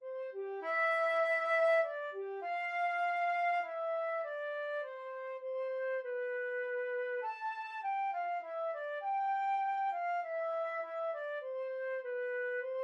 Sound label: dona nobis pacem, flute trio